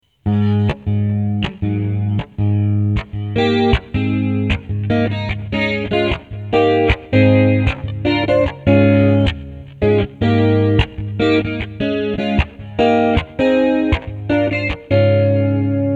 squeeze chorus, guitar; squeeze bad cat chorus

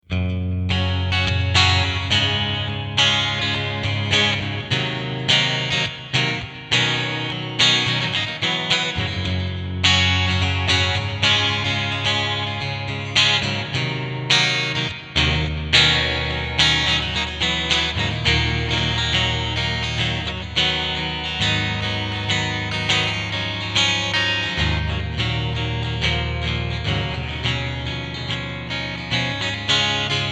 clean, guitar

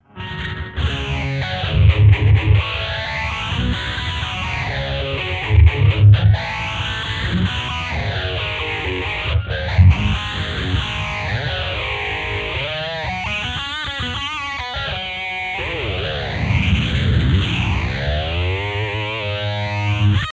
6505 dist, guitar

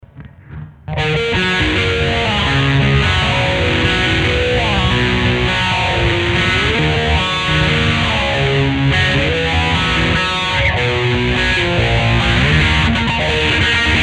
clean analog phase, guitar

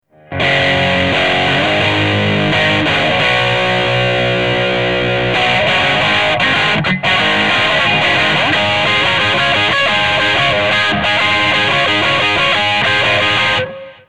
brit dist, guitar

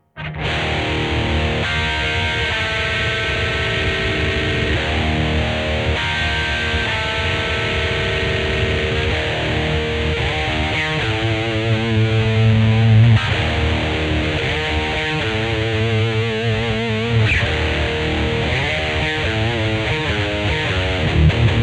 6505 dist, guitar

